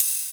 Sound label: Ride, Cymbals; Vengeance Samples